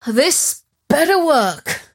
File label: cast pain; dota; mirana